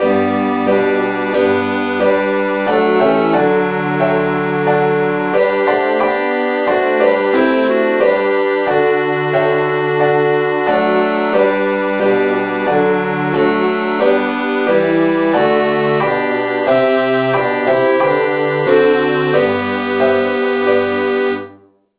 satb key g; saintthomas

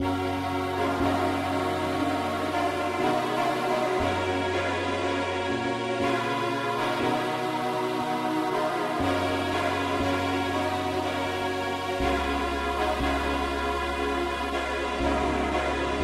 pop No Voc Ac key fis; Jesus, Saviour, pilot me

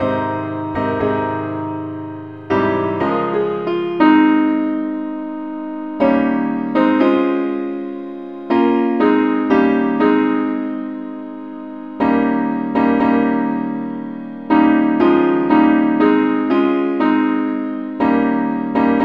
simple acc key aes; pilot

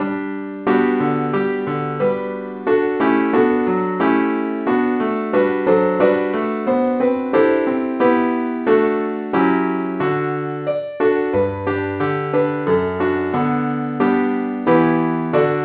keyboard only key G; SAINTTHOMAS

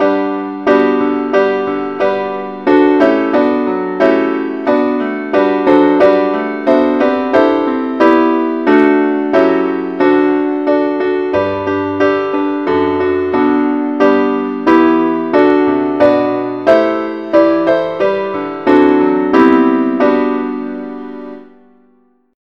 keyboard key g

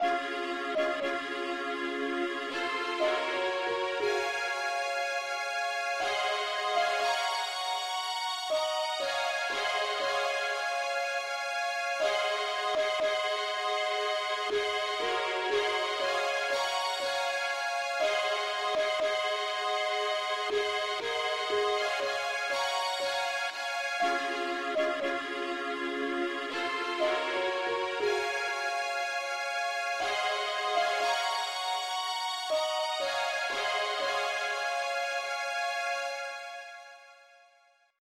mandolin Lead key cis

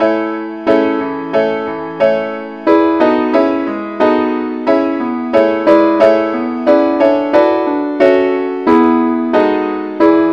keyboard key a; saintthomas

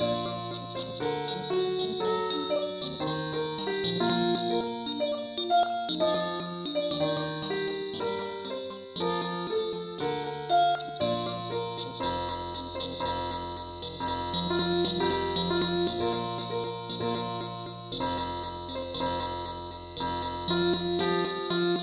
mandolin key bes

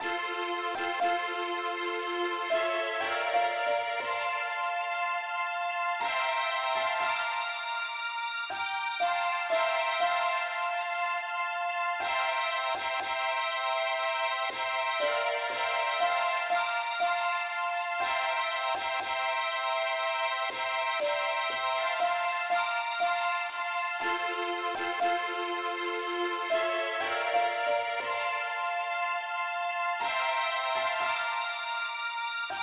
uke lead, key f; pilot

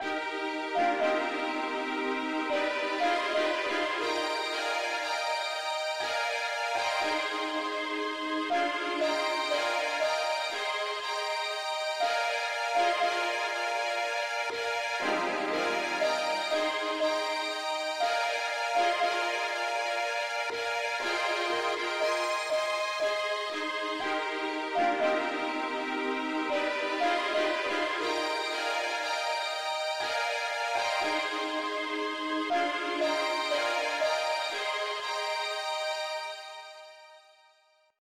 pop uke lead key ees

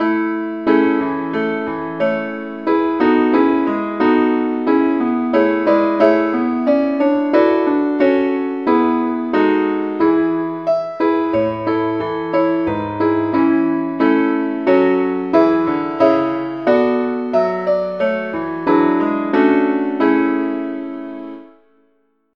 keyboard only key a

